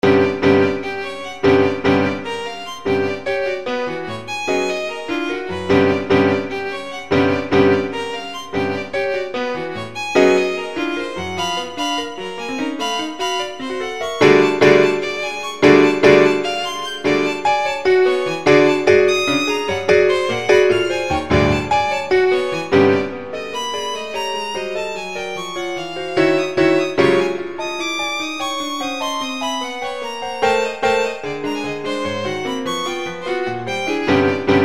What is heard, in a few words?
Sonata for Violin III